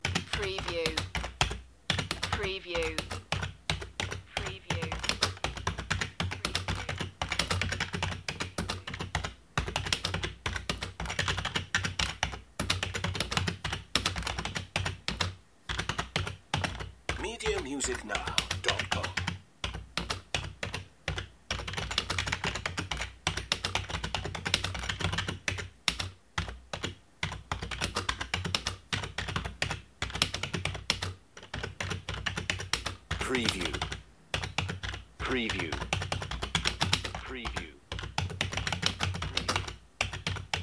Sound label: Keyboard Typing Slow; lynne-publishing